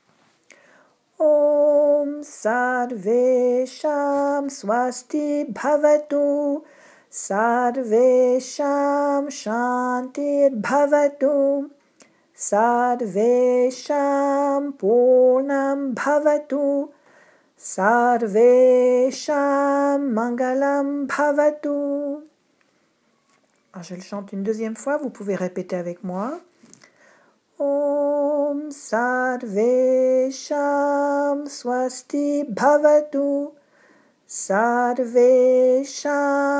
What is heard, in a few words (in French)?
chants